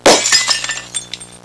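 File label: breaking; HAUSHALT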